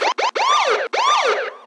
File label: Police siren; SFX; 7rystan's 600+ FREE EDM SAMPLES & DRUMS